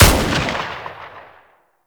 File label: Uzi Fire; Warframe; Tenno; Prime Tenno Uzi; Weapons